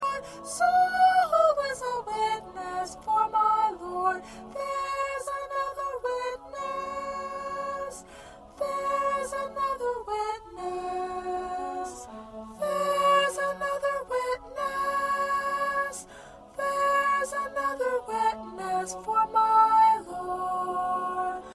P Witness 2nd Soprano

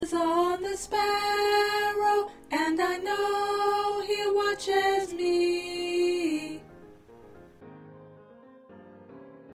2nd Soprano

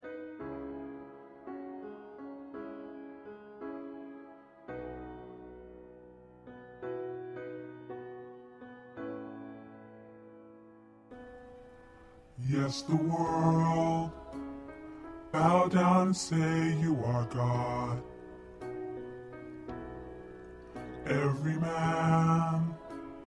Bass, choir

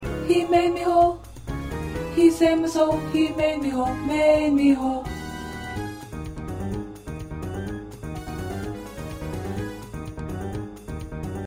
Tenor, choir

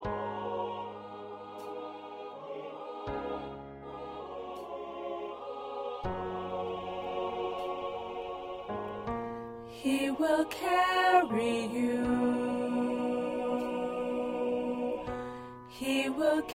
Choir Alto

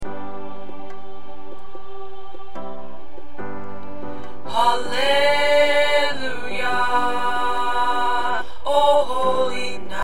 Tenor

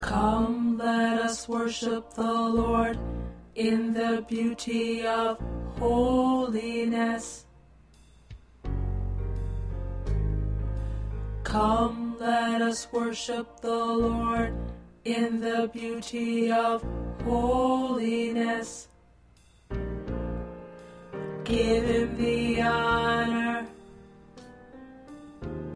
Tenor

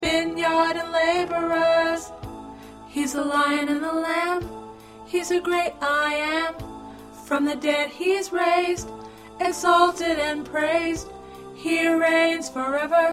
Alto

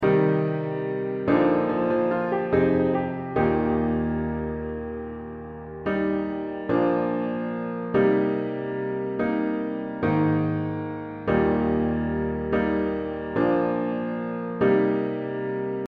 Piano

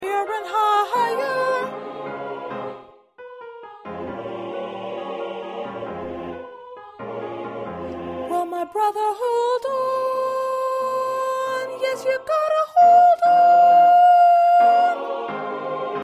Small Group Soprano

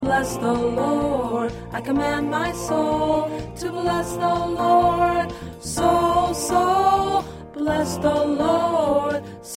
Soprano, choir

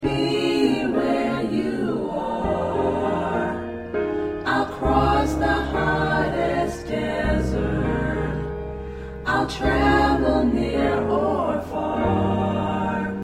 SATB

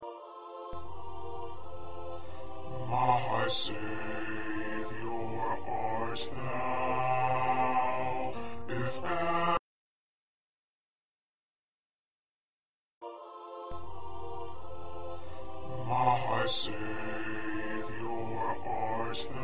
Bass

